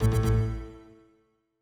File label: Critical Stop, sound scheme; Longhorn 7ista Sound Scheme by David Mounder